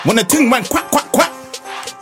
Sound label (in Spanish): trompa